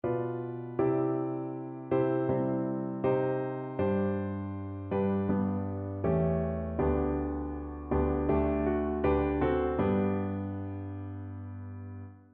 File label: myfanwy, piano